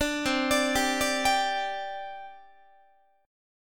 C Suspended 2nd, C, down, Guitar; Csus2 arpeggiated

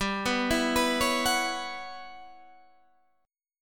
E Minor 6th Add 9th G chord

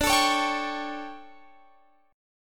D Major 7th Suspended 4th, Guitar, Open F; DM7sus4; strummed

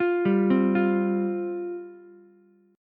F Suspended 2nd F Ukulele; arpeggiated; 10,7,8,8; JGuitar

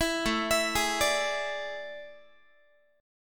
A Major 7th Suspended 4th, Guitar; AM7sus4; arpeggiated; 19,17,19,18,18,x

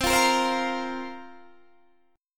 C 7th Suspended 4th, Guitar, Standard; C7sus4 strummed

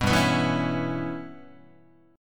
G 6th Flat 5th G, Guitar; G6b5 strummed; JGuitar

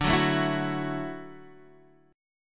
D-Major Add 9th, D, Guitar, Standard; strummed

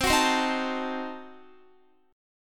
C Diminished 7th, Guitar, chord; Cdim7 strummed

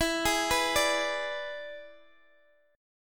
E 7th E down Guitar Open D

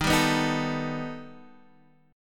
B Augmented 7th D sharp

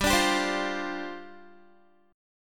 G 7th Suspended 4th G down Guitar

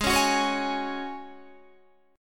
G sharp 7th Suspended 4th G sharp, Guitar DADGAD

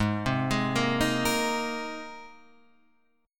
G sharp Major Add 9th, Guitar Standard; arpeggiated; 4,3,6,3,4,6

